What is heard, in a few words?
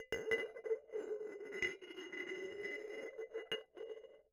Bottle Struck